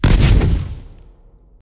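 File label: aoe fire magic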